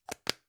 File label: gum plastic box close